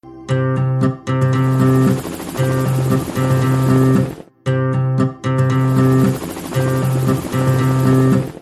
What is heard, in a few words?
love ringtone; tamil; saamy 2